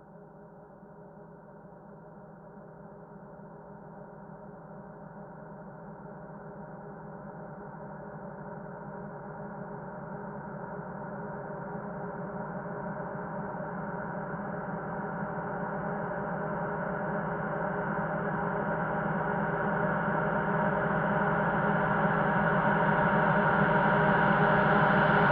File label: Reverb Athmospheres, FX Vocals; Vengeance Samples